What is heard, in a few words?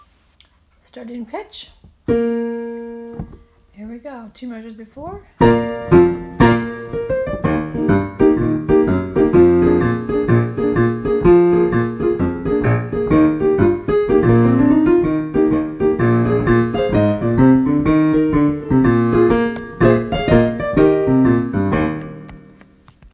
Accompaniment